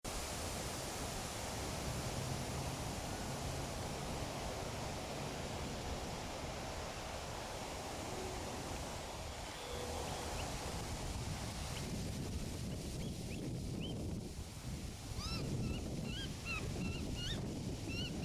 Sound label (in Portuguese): Canto do mineiro barulhento